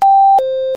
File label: Sepura RX